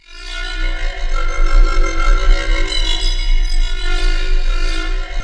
bowed mandolin; DirectX SDK; DMusProd

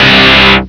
Warning; Kinga 2.0

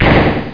EXPLOS; PCMania; proteus

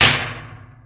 impact heavy; Fish Fillets

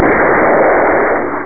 explodes; Galax

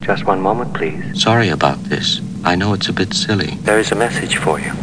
page

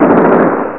rifles; TacOps

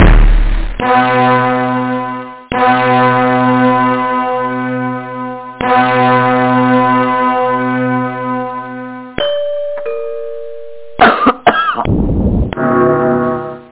tom, 8svx; StoneTowers

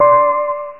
Match 4; Jewelbox 1.0

